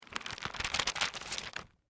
Bible Flip Pages, Bible, Flip, Through, Pages, Book; Foley; BlastWave